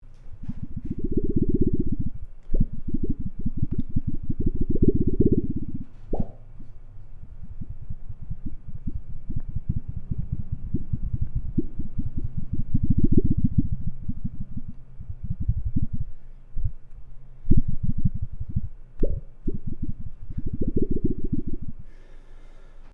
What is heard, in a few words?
wobbling a plastic cutting mat